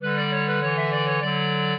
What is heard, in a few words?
clarinet; Mozart